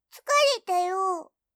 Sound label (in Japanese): Windows ログオフ